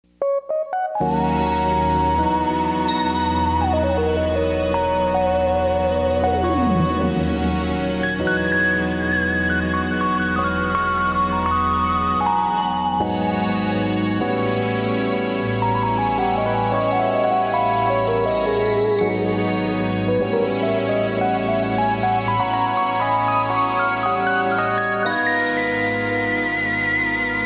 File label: oberheim